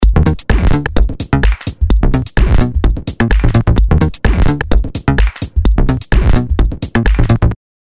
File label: electro